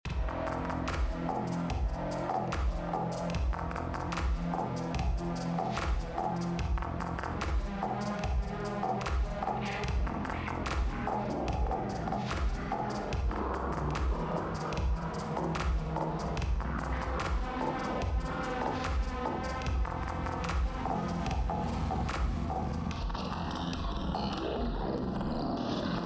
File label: game music theme music